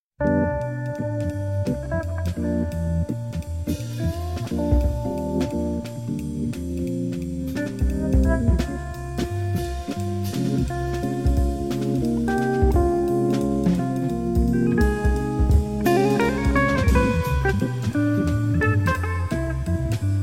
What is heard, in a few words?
Piano Waltz